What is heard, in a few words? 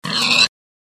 stab pig; freak fortress 2; kim pigman